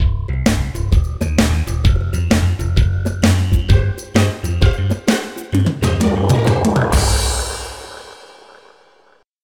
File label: Stage completed; Frogger 2 original game rip